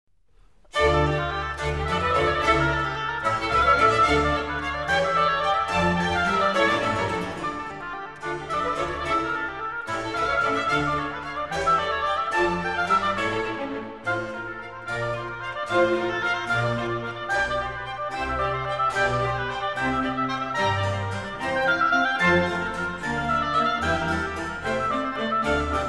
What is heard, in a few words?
Oboe Concerto in D minor; BWV 1059r Movement 1